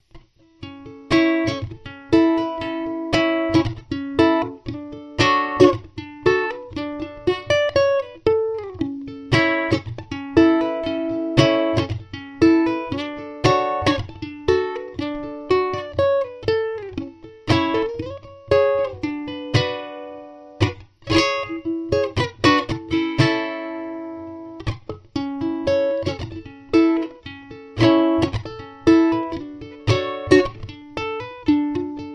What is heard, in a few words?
Tenor A strum pick